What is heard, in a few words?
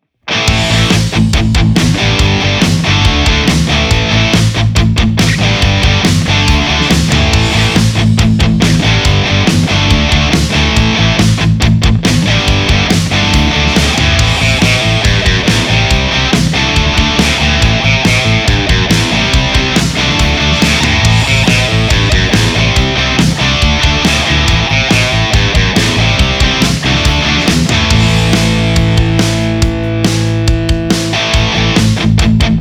Two Notes Torpedo Captor X Backing Track Rhythmus